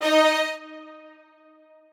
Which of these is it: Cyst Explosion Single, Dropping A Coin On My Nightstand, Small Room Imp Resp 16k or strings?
strings